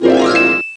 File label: re-appear; The Pea; SoftKey